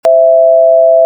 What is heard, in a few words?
signal